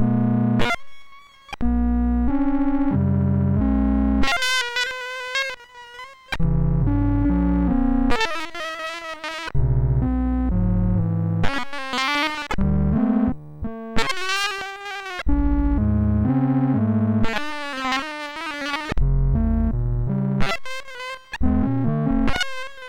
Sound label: Organ Torture, MOS6581